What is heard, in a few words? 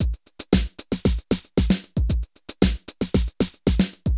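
drum loops, right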